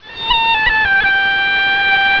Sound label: ney